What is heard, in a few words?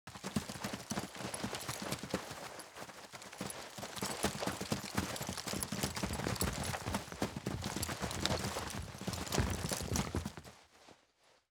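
Footsteps, Group, Soldiers Run with Gear Metal; Fairlight Sound Lib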